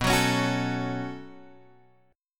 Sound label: B 7th Suspended 2nd, Guitar, Open G